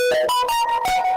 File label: samsung notification sound effect but bass boost